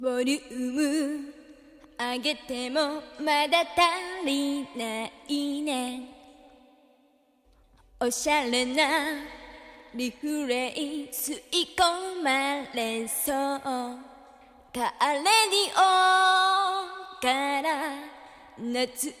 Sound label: vox